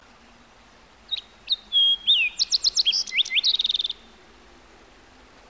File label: Bird Sms